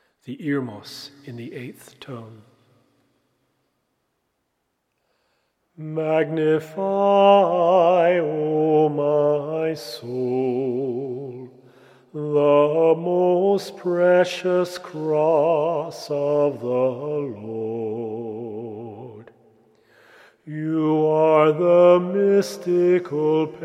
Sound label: Elevation Cross Irmos, Serbian Chant; AnthologySerbChant